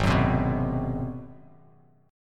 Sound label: G sharp 7th Sharp 9th, G#7#9 chord, Bass Standard; strummed; JGuitar